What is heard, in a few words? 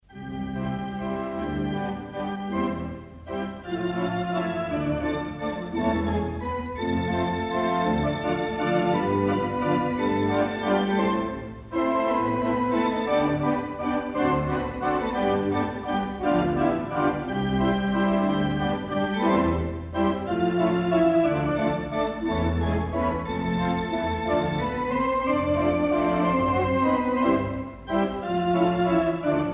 cinema organ; Wurlitzer; Granada, Bedford; Since 1934 at the Granada, Bedford, the console of the mighty Wurlitzer would appear